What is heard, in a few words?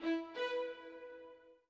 orbit violin; Sky Children of the Light gamerip